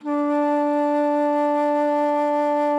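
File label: bass flute D4; Xsample VOL-2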